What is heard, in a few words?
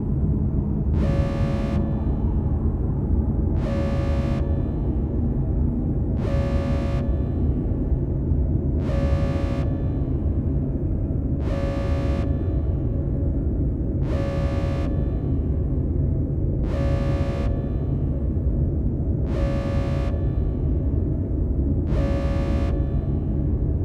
place units ambience